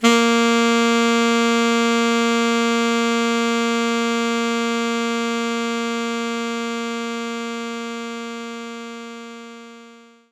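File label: Alto Sax A#3; Knights Try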